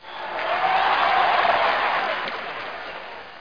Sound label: die